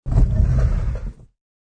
Shrt Cart Roll, Short sound of a janitor's cart being pushed on concrete; Rolling; Objects